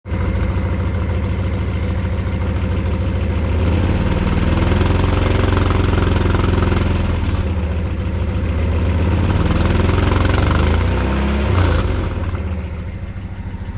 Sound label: 84 pickup 22r 2wd odd sounds during throttle